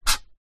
foley metal aerosol can single scratch sharp object